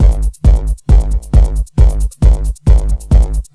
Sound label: Drums Techno